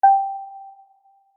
multimedia alert prompt feedback tone simple single note sine chime